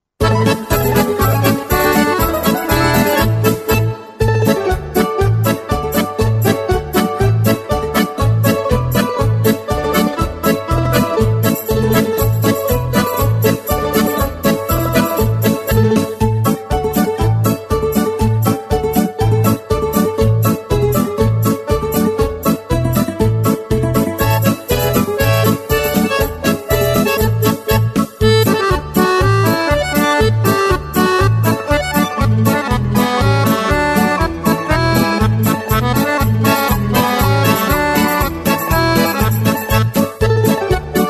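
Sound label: Russian folk music; muzyka dlya prazdnika v dou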